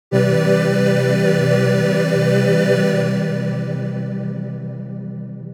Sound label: Epic Moon Pad; jup8